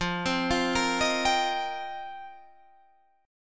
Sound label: F 9th Suspended 4th, chord